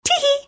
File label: laugh; dota; enchantress